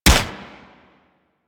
Swarm Rifle Shot

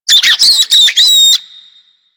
Infested Minion Bark, Vocals; Warframe

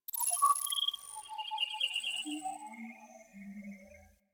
Beep Short G; Ambience; Interior; Warframe; Orokin

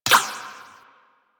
Ricochet; Warframe; Cowgirl

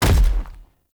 Step Default, Footsteps; Enemy; Nimrod

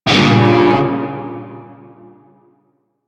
Shawzin Phrygian Chord H; Music; Warframe; Narmer Shawzin

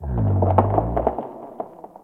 Landscape Mushroom Creak, Ambience; Warframe; Venus Open World; One Offs

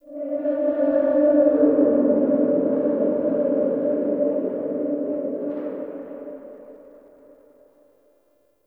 Eerie Drone; Warframe; Ambience; Nineteen Ninety Nine

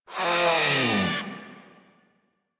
Kavat Vocal Death; Warframe; Khora

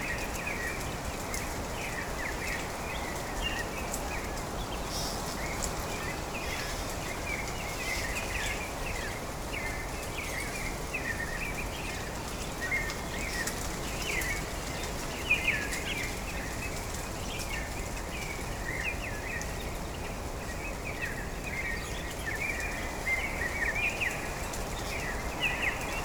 Wetland Forest Day Amb Loop; Ambience

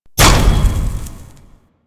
Scrap Container Open

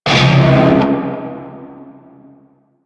Shawzin Phrygian Chord D; Music; Warframe; Narmer Shawzin

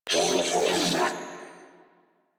Death, Female; en; Warframe; New War; Narmer Grineer

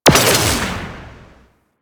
Space Fighter Ship Turret Fire A; Warframe; Corpus

